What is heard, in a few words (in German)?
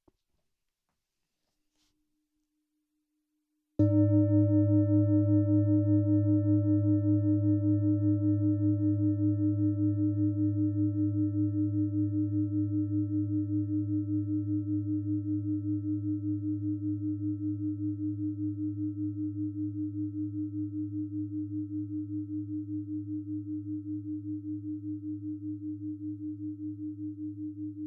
Klangschalen Klänge